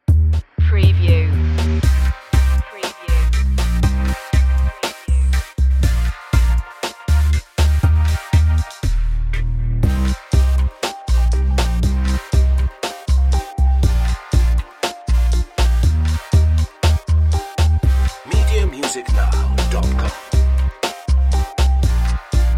bittersweet electro hip hop

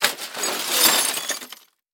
Debris Glass Plastic

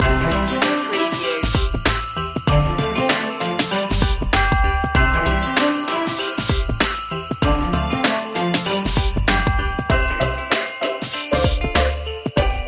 festive funtimes loop

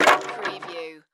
Crash Wood Drop 2x4

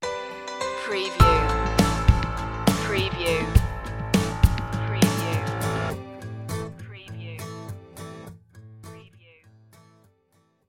ocean breeze stinger

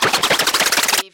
CARTOON SPIN

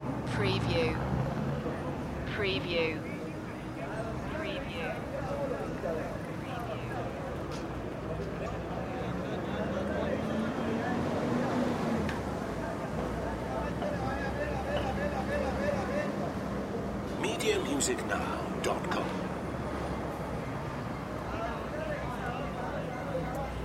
city ambience bogota

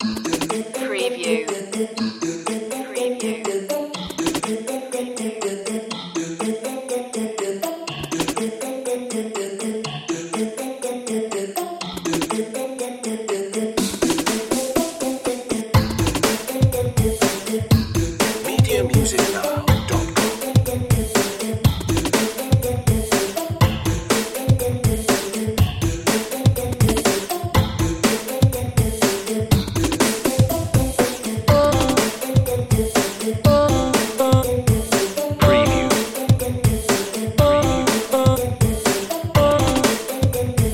computer soul 122bpm

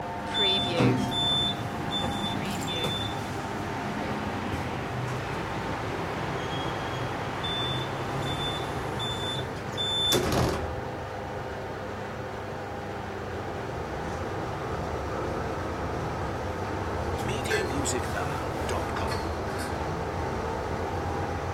elevator ride loop

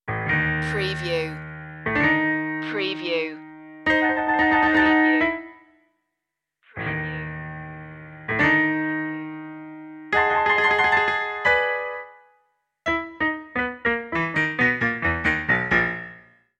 silent movie villain loop

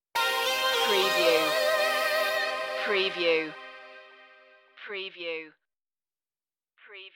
funky stinger

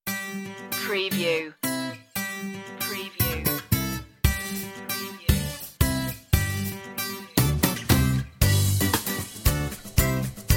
clear as day

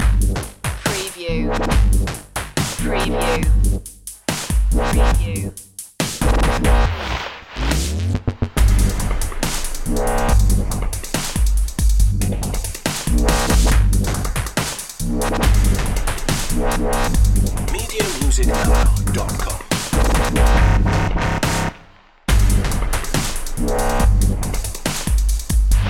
ice ragga loop

